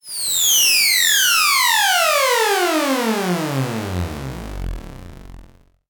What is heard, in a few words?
science fiction machine shut down; zapsplat